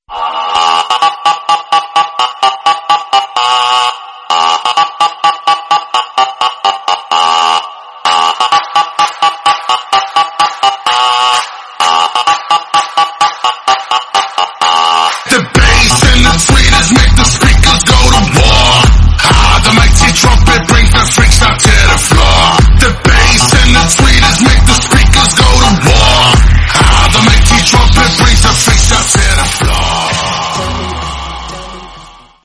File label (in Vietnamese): nhac tre remix music